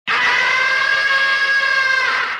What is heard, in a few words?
yell; cave